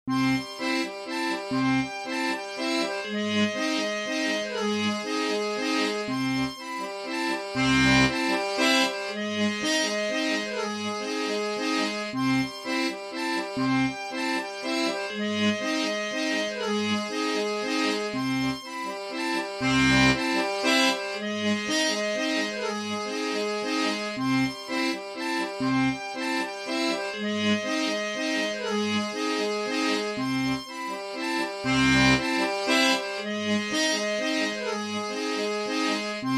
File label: Old Outro Loop